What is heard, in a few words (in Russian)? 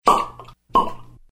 otkroy butilku; noviygod